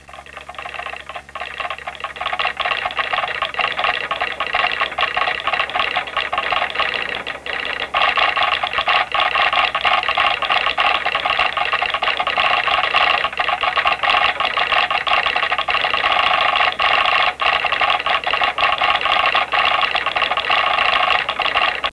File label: morse, communic; WAV Sound Effects; office